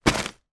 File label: present wrapped put down; foley